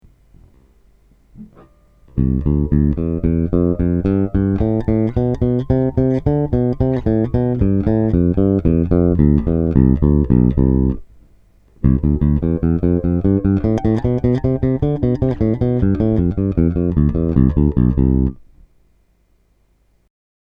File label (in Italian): Esercizio Pizzicato 4 dita